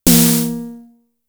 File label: Tom, Toms; Fricke Schlagzwerg; Drum Machines